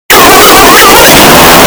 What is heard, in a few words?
brain farttttt